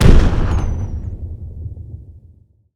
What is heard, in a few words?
explosion